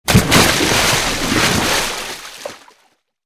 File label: Falling Swimming Poo, impacts water